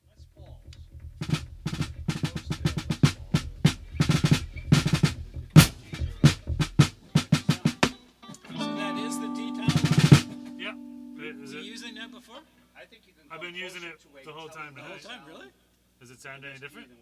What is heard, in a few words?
Drum Dampening; Babydoll Negligee